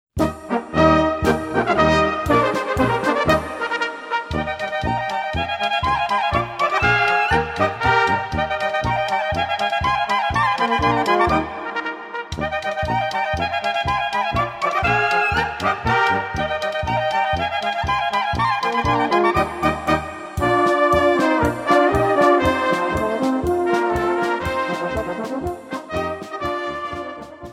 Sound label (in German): Klarinettenbravour